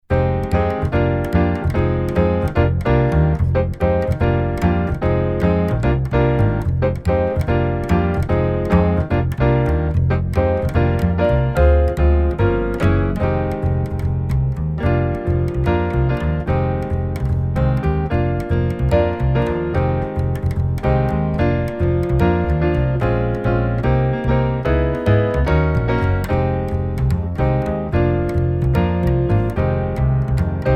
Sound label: Jazz Waltz Bass